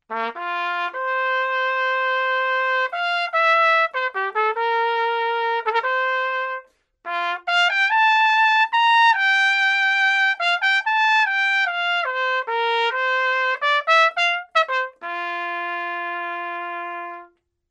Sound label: Cornet